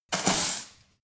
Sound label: broom sweep close